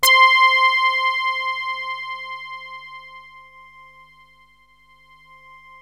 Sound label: BASS FORM; MORPHEUS; Syntec; Wall of Sounds